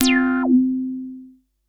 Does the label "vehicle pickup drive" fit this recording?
No